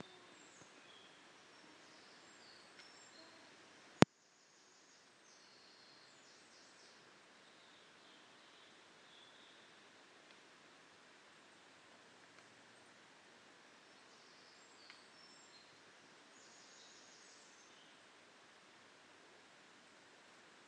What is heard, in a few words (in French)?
bruit de foret